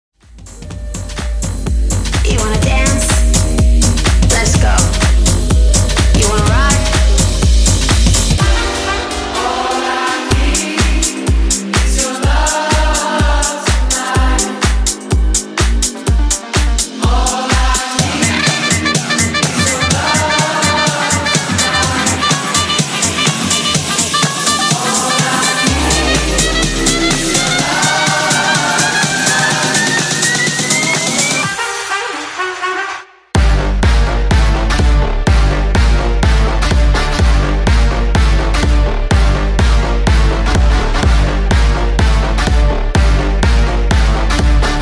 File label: Mashup, 125 Bpm